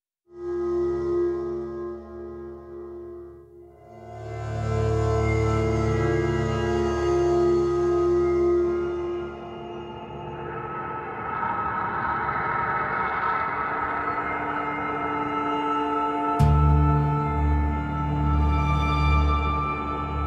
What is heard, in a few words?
Sewers Ambience Theme